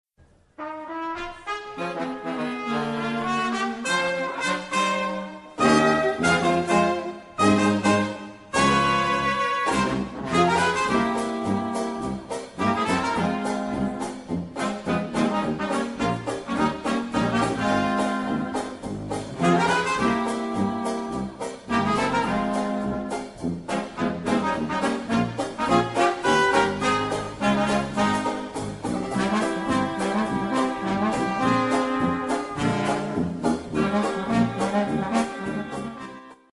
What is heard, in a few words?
Lonely Melody